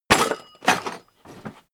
tool draw hard

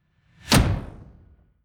incoming metal spike

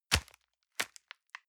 envelope grab release hard

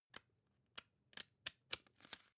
magazine browse

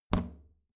plastic trash can close regular

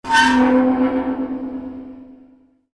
metallic scream

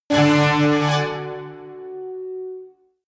jingle orchestra brass hit excitement

